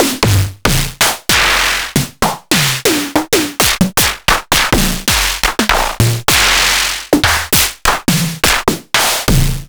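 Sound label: ms20 processed snares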